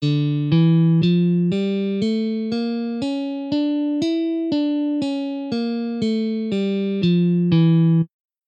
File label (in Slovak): D harmonicka mol a A ciganska dur span cvicenia 60 bmp